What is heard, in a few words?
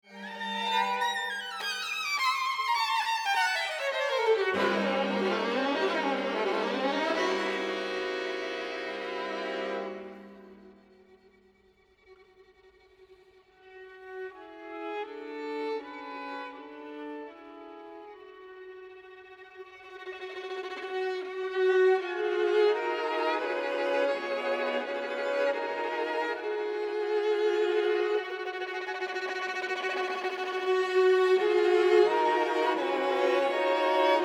String Quartet; String Quartet no 1 2nd mvt